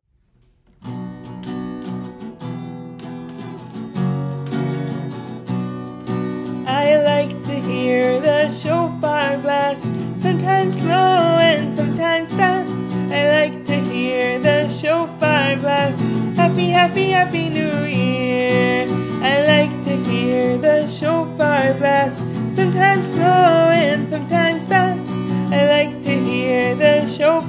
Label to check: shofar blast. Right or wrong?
Right